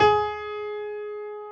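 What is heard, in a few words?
grand piano; B-GRAND PF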